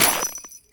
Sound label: Jester Step Ice, Footsteps; Foley; Warframe; Grineer Royal Jester